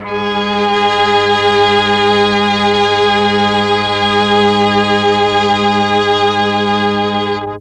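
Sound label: MELLOTRON strings Ab2; Big Fish Audio - First Call Vintage Keyboards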